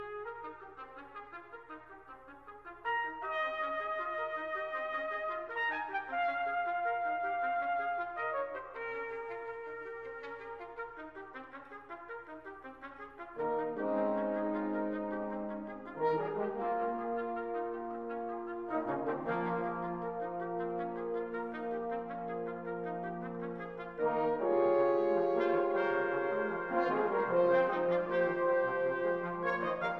Concerto for Trumpet, Brass Ensemble, and Percussion Allegro